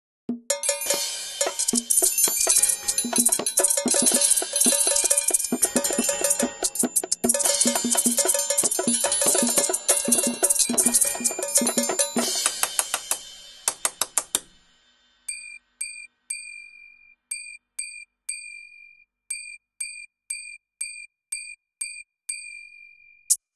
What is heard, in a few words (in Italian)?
Musica Strumenti Musicali